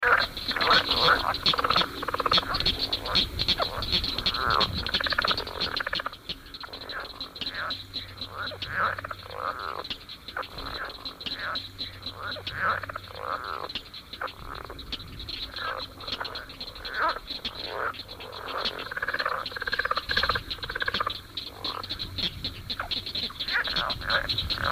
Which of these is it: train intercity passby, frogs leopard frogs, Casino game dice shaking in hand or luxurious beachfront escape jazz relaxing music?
frogs leopard frogs